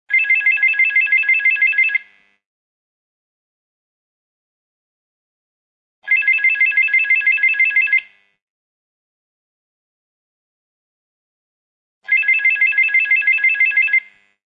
Digital Telephone, Telephone ring, digital telephone type 2, three rings; Telephones (Sound Effects:Electron